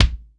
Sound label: NEW MIX KICK